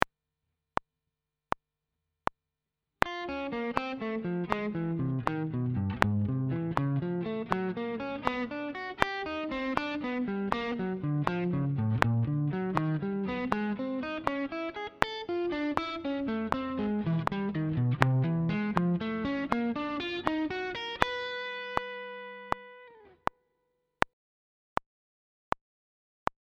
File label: slow 80bpm